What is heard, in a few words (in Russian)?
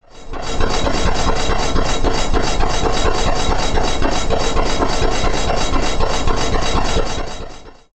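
поршень двигатель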